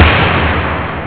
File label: Ending snare; jazz jackrabbit 2